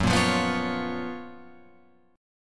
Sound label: Gb Major 11th down Guitar Open F; GbM11 strummed; JGuitar